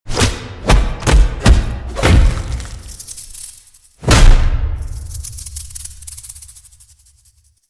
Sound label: punchs; melon